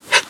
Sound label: dog pant